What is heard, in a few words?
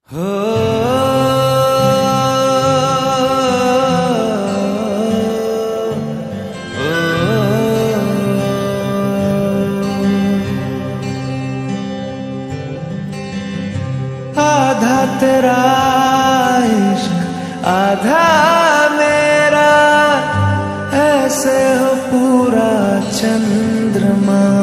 lofi slowed reverb song